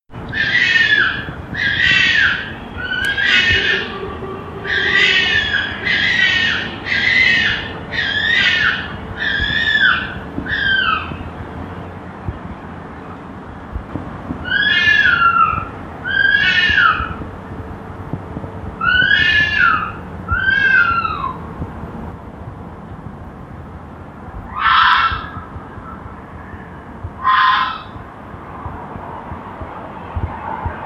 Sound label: red foxes fighting; Communication